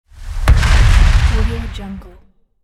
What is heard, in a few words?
hit explosion